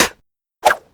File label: attacking feather spit